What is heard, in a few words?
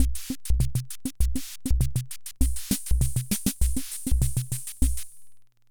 Hammond Auto-Vari 64 Loop, drum machine; Hammond Auto-Vari 64 Ableto Project; 300 Drum Machines